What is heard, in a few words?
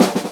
Drum roll Snare Short